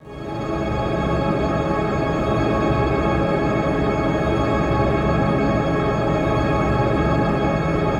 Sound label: The End Pad, Synth; The Forge by Hecq; Ableton; Factory Packs